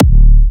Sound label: Kicks, Big Room Kicks, D4